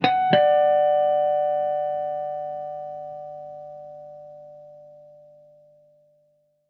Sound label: Acoustic